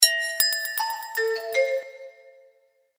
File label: Chrome Alert, notifications; Motorola Atrix-2